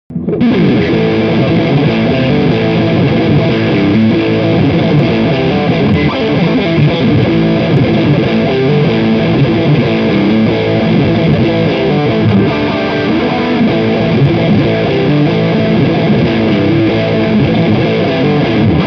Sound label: rock riff